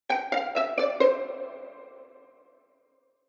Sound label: articulation